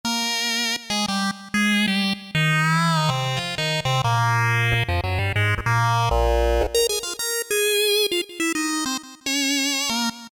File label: Wet Reed, Korg MS2000; Factory Patches